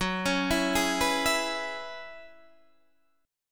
F sharp 7th Suspended 2nd Suspended 4th F sharp down Guitar Open D